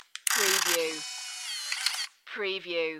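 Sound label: Turn Off Camera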